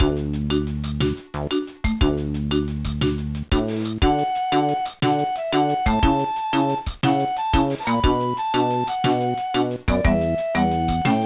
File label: BGM; oshaberi inko club; gba